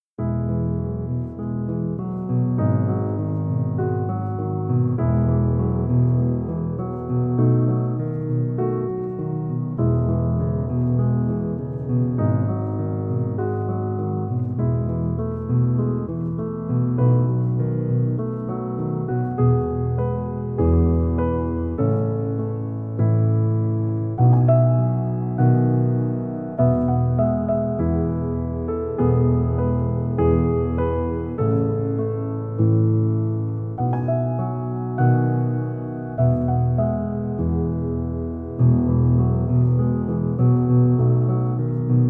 Relaxing Piano Music

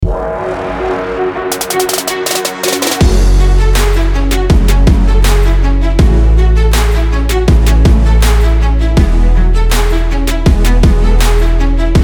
China cinematic epic music

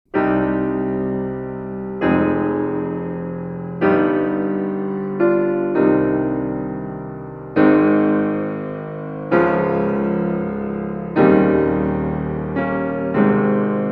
Prelude in E minor, for violin, cello and piano; Fryderyk Chopin; op. 28 no. 4

